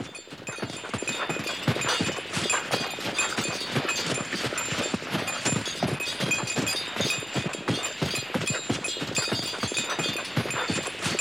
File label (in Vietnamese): mot con ngua phi nuoc dai trong tuyet vao mua dong voi chiec chuong quanh co